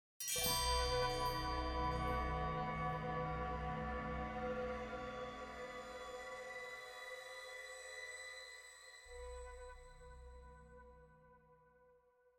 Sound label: Spirit Trial Stinger; kwoloks Hollow